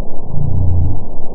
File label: Gray Whale Call; Rocheetal